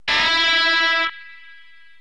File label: unison bend, guitar; String bending